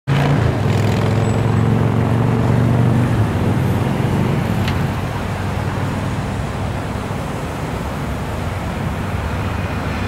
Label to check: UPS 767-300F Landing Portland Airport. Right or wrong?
Right